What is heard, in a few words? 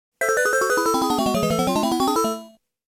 Error Sound; arcade; namco classic collection vol.1; Galaga; Captive